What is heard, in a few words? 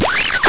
Radio Tuning; CephaloTron